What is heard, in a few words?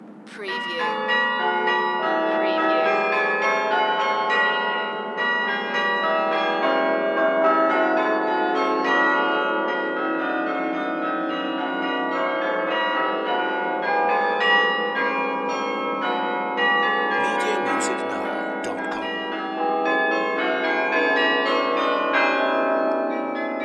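Bells Carillon Canada Parliament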